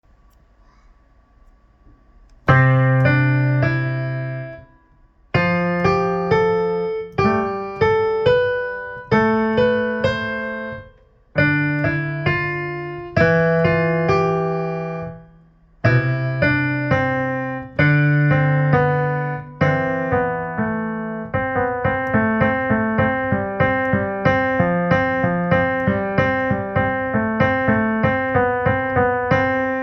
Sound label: music